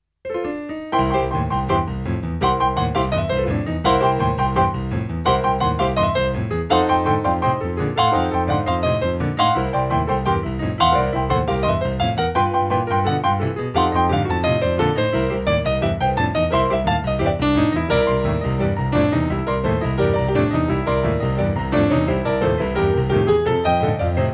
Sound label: Flick Flack Boogie